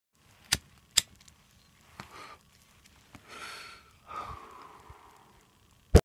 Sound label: lighting weed